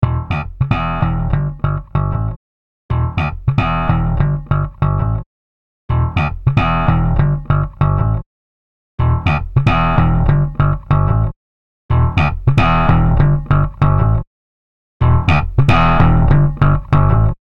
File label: BASS BEFORE TUBE